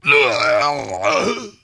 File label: Wound Heavy; Putress